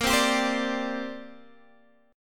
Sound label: C 7th Suspended 2nd Bb